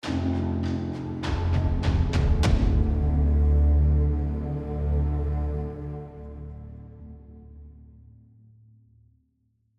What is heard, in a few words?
Tension End; the last of us